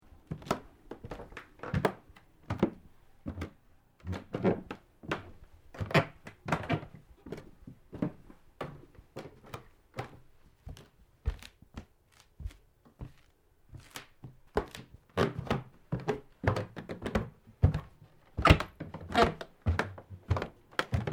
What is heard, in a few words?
Footsteps Socks Stairs Wooden Squeaky Sequence; Footstep Foley; Human